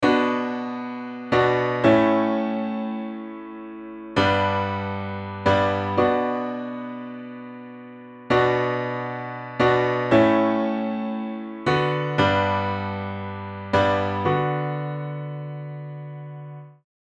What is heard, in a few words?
piano dry audio; waves J37